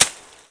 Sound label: Ball Sounds; Pangya; Fantasy Golf